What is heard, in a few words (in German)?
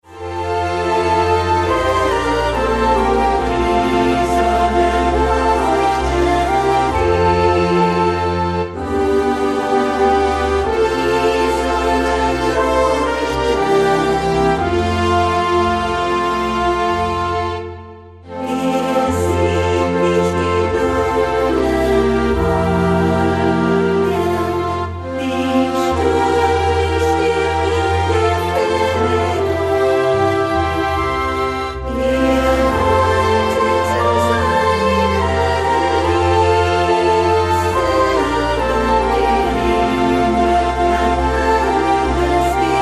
mit Chor